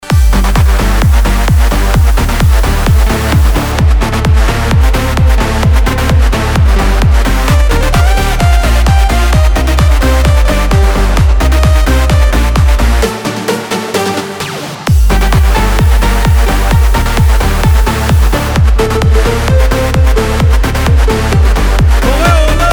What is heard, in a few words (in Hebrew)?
רינגטון